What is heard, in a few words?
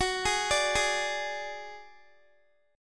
Gb Suspended 2nd Sharp 5th, Guitar; Gbsus2#5 arpeggiated; x,21,18,19,20,x